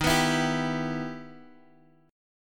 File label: E Minor Major 7th D sharp